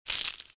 Chocolate Break; Cookie Jam

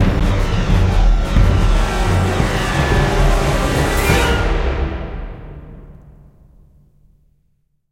Terror Sting; Jurassic Park The Game

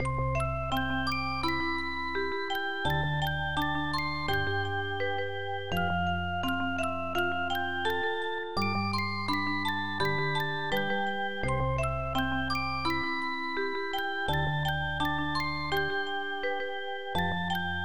organ; Harmie

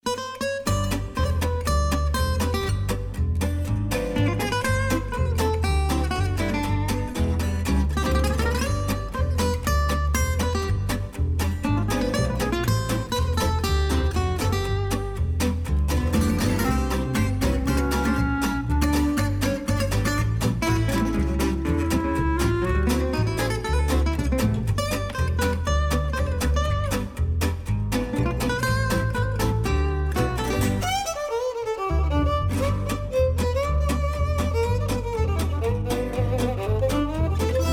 Radio Music; Jurassic World Evolution 2; PS4, PS5, Windows, Xbox One, Xbox Series X/S

